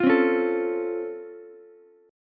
Db Augmented Major 7th, down, Ukulele; strummed; JGuitar

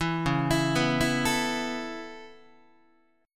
Db Minor 7th Sharp 5th Db Guitar; arpeggiated; 7,9,7,9,8,8; 4A,4E,5A,5D,5G#,6C#

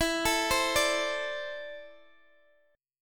E 7th Suspended 4th Sharp 5th Guitar Open D; chord shape

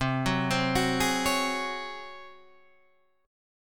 C sharp Major 7th Suspended 4th C

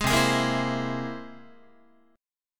C Augmented 7th, Guitar; strummed; JGuitar

